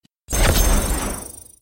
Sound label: Gold Medal; Overwatch